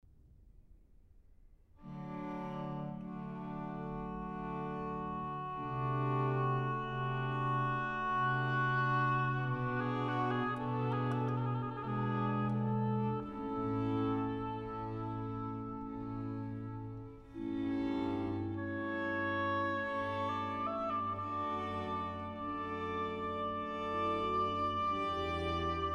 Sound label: Oboe Sonata in G minor, Adagio; George Frideric Handel; Esther 1718 version; Purim; HWV 404; III Adagio